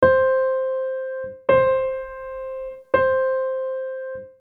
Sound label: hybrid attack